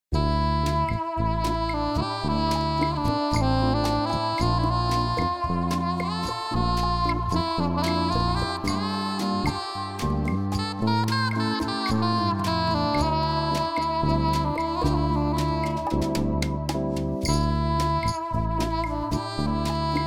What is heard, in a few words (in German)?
Saxophon